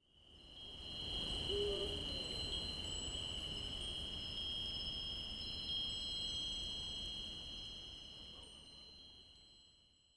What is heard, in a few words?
Night sky